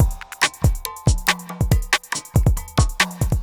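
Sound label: industrial 140 kit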